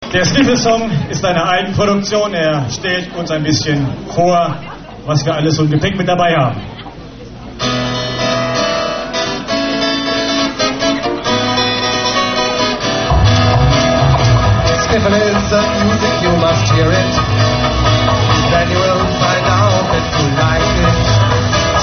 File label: skiffle song